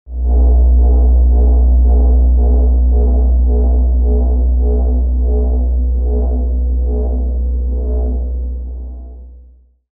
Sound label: Pulse Tone, generators; gmod